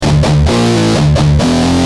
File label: G Jam, Guitar; Industrial